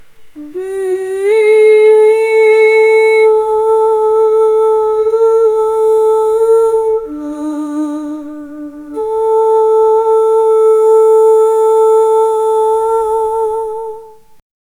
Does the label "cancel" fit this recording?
No